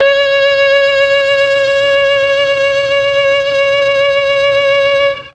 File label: C#4 VIOLA, Mellotron; Mike Pinder - Mellotron Archive CD